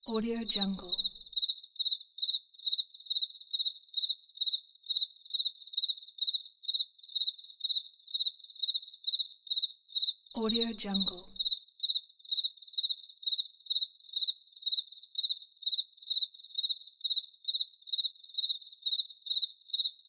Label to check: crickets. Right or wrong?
Right